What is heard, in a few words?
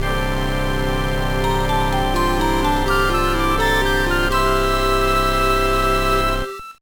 Jingle Save; Shining Force Resurrection of the Dark Dragon Original Sound